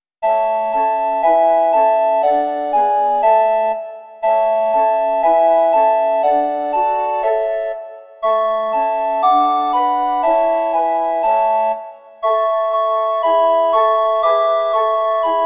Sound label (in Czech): 2 zobcové a 2 příčné flétny; Ovečkou že Páně jsem; Imaginativní verze